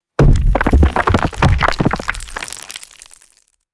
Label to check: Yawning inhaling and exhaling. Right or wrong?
Wrong